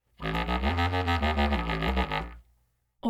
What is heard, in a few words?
contrabass clarinet short low range phrases